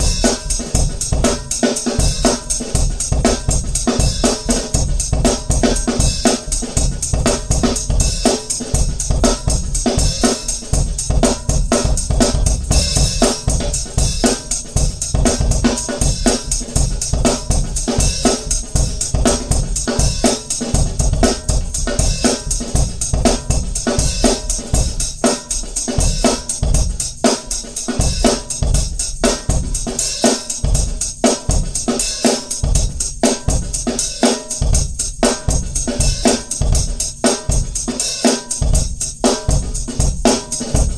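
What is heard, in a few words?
subway play for tips playlist drums; The Sims 3; Windows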